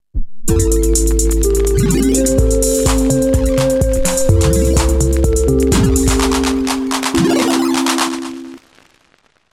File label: audiologo